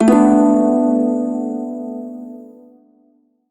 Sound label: Shawzin Maj Chord; Warframe